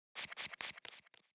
draw end, poker; gambling; risk; American Poker Gold; wazdan

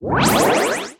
reels start; Back to 70s; wazdan

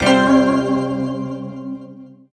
big win; Butterfly Lovers; wazdan; ultrafast

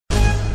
mystery; Criss Cross 81; wazdan

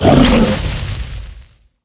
win fire; DoubleTigersWD; wazdan

